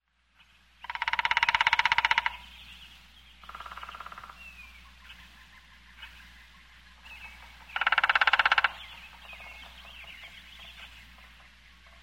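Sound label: sounds of woodpecker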